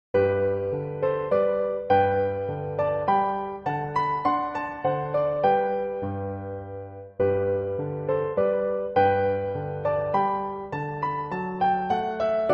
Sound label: Waltz Accompaniment Track